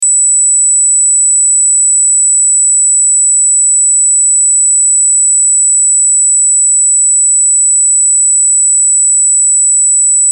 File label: GEQ 8000 Hz